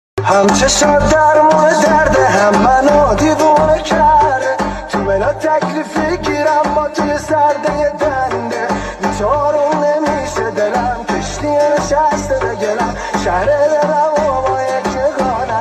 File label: Jam